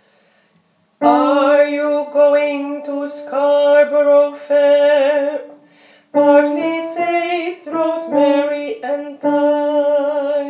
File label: Scarborough fair mezzo